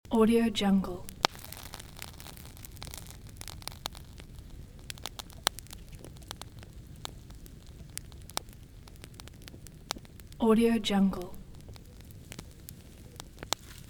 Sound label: adding to burning bonfire loop; fxprosound